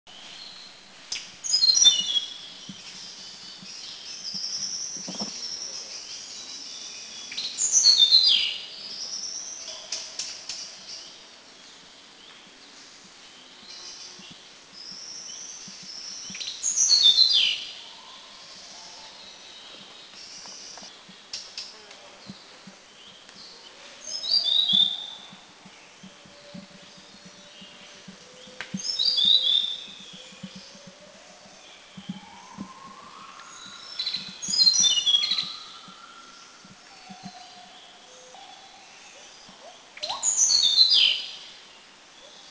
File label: Hill Blue Flycatcher Song, Khao Yai national park in Thailand